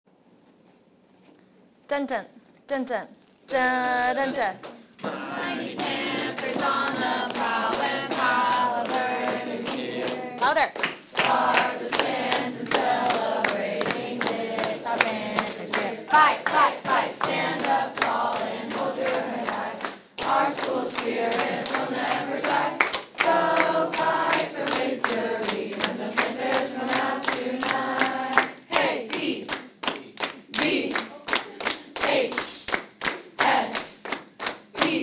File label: fight song